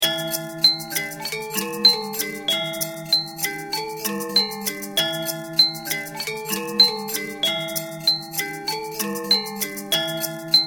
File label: beat